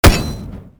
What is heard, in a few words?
attack impact; Li Li Shadowpaw Sound Effects; Li Li Heroes of the Storm Responses; warcraft